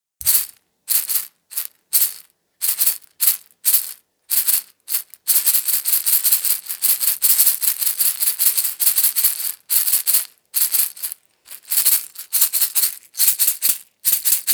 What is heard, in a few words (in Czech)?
chrestidlo hosho